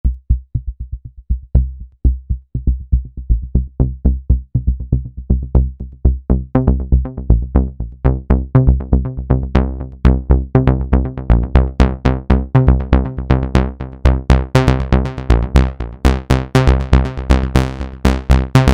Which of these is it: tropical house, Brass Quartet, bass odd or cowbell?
bass odd